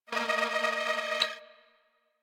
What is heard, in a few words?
Beep Stutter; Warframe; Ambience; Corpus